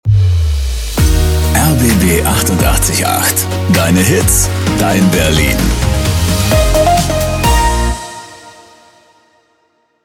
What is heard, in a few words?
Transition Up Instrumental